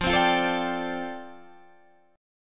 F 6th Add 9th F down Guitar Open D, chord

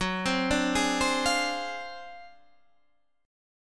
D 7th Sharp 9th Flat 5th Gb, chord

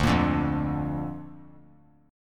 D Minor Sharp 5th D down; Dm#5 strummed; JGuitar

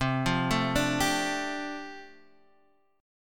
G 7th Suspended 2nd Suspended 4th C

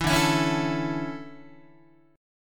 D Major 7th Suspended 2nd, D, down, Guitar; strummed

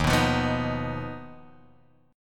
Eb Suspended 2nd Sharp 5th, Guitar, Drop D

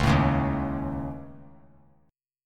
C sharp Suspended 2nd Sharp 5th C sharp; C#sus2#5; strummed; JGuitar

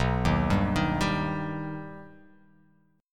B Augmented B down

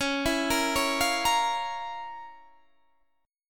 F Major 7th Suspended 4th Sharp 5th Db

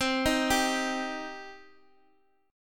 C Minor C down Guitar Open G

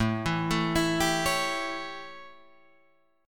A 7th A, Guitar Drop D

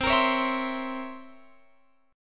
C 7th Flat 9th C Guitar Open F; C7b9 strummed

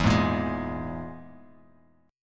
E Major Flat 5th E, Bass Standard; strummed; JGuitar

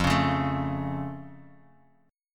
F Augmented, Bass Standard; strummed

